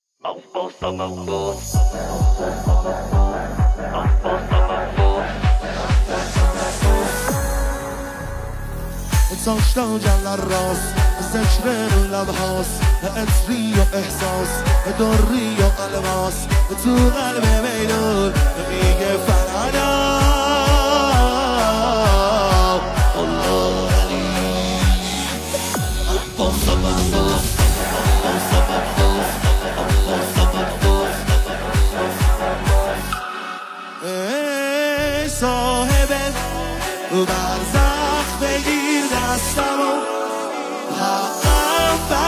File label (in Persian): مداحی